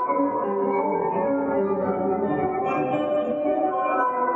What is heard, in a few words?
Tonal Re Draw, sparse soundscape